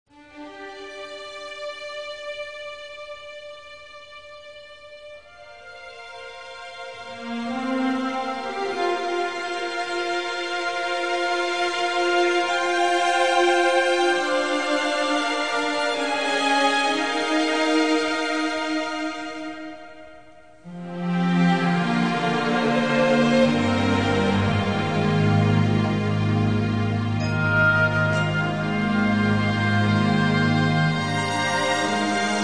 Orchestra Panpipes, There Is A Balm In Gilead (Medley)